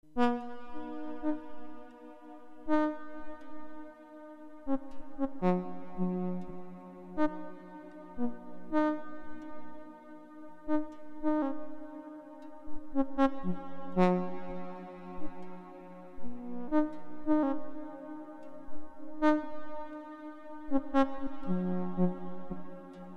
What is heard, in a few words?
Atlantis ambience; Scanner